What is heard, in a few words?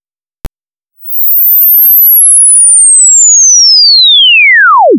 inv sweep lin